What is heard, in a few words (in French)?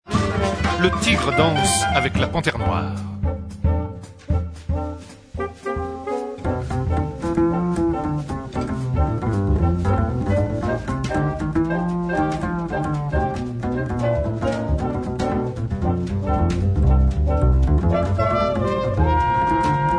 jazz